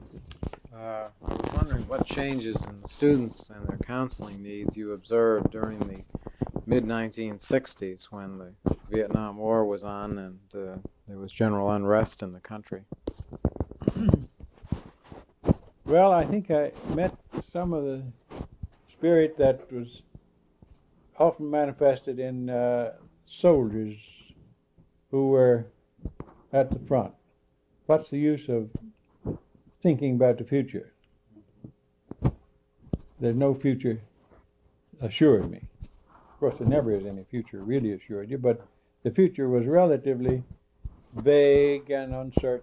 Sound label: Bell